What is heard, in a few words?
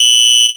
alarm